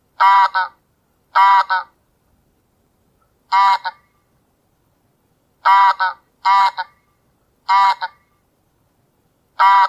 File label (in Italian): Oca selvatica